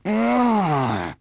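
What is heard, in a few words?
male angry; People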